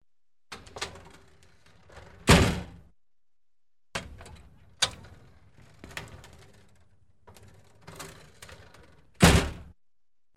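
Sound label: door with blinds opening and closing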